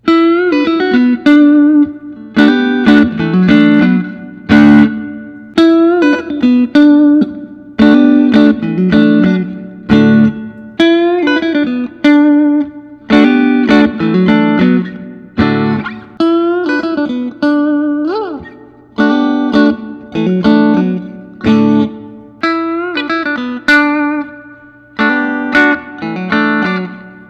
fender custom shop stratocaster; pro hardtail

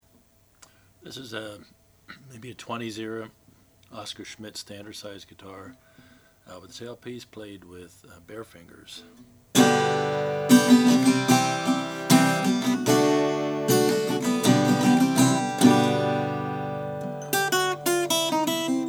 oscar schmidt stella natural spruce

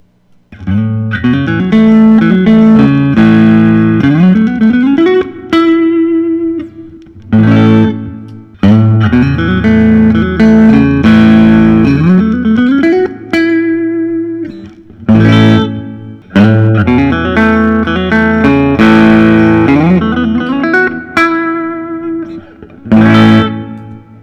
vintage t blonde transparent, guitars; grosh

